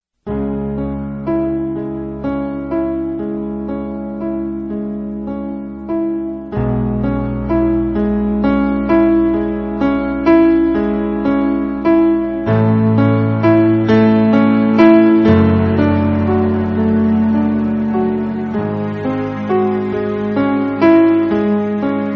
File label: sonata